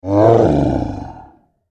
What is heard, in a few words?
Monster Growl Snarl Beast Creature; Horror; Animal; Monster Sound Effects